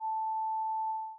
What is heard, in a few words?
scan